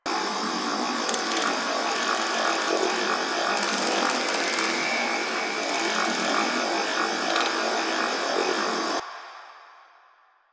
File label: Cave Computer Loop; Ambience; Warframe; Stalker Cave